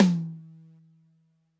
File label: Drums, Kawai K4, Drum Machines